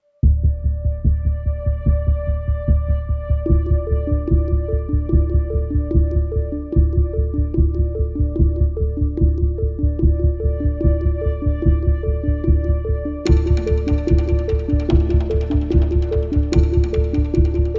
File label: Tense Rising